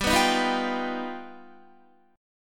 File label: G Augmented 7th, Guitar; G+7 strummed; 14,14,15,16,17,15; JGuitar